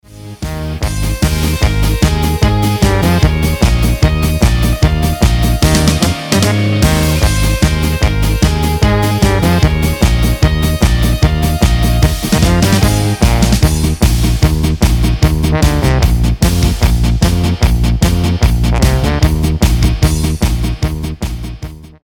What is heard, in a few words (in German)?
Polka